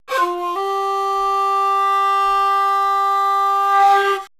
prc G3, Sho Percs Pad Shaku, CASIO FZ-1; Retro Sampler

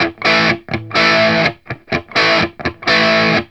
RIFF 125, Vintage Blues Guitar; USB Soundscan vol.22; AKAI

